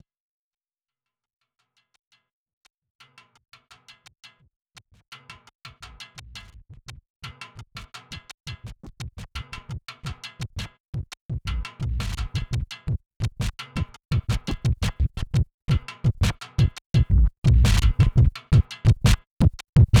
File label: break